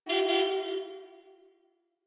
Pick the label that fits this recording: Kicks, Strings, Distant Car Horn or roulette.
Distant Car Horn